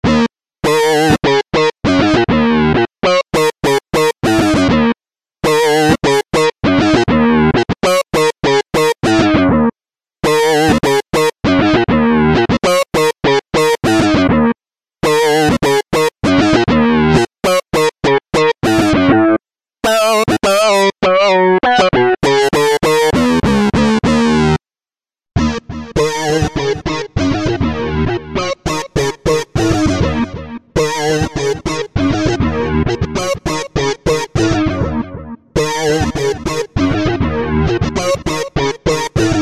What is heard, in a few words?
Synth Lead